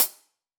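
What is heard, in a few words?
Sab Closed Hat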